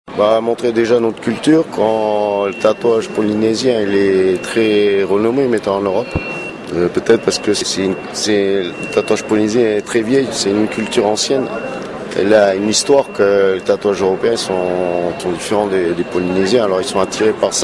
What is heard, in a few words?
CHIME; le tatau polynesien au salon mondial du tatouage a paris